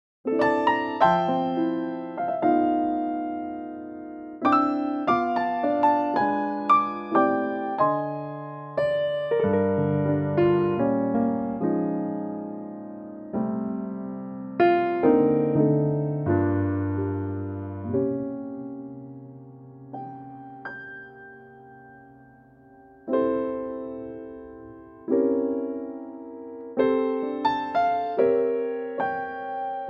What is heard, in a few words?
F piano; key - F - vocal range - C to E; sensitive piano only arrange; Another beautiful standard